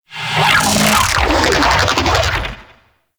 Sentient Vocal Attack

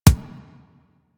Weapon Punch Pistol

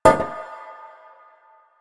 Stone Cafe Table Impact; Warframe; Nineteen Ninety Nine

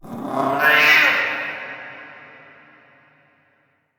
Meow; Kalymos; Warframe; Nineteen Ninety Nine; Quests; Romance NYE

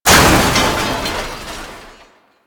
Grid Door Destructible; Warframe; Ambience; Entrati

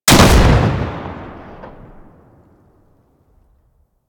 Hand Shotgun Shot, weapons; Grineer

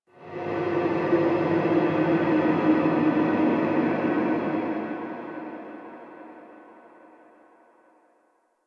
Crp Ship Energy Distant Texture Large; Ambience; Warframe; Corpus Ship Remaster

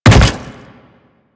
Step Default; Leto

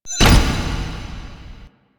Vaults Stairs; Warframe; Entrati

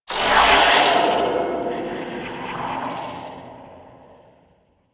Derelict Corrupted Artifact Pick Up; Ambience; Props; Warframe; Orokin Derelict

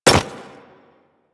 Hyena Micro Step Default, Footsteps; Warframe

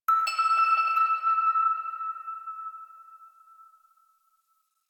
Mallet; Warframe; Ambience; Clan Dojo; Dojo Randoms

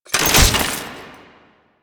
Exalted Sniper Swap From; DE; Warframe; Warframes; Frumentarius

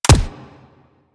Weapon Punch Rifle

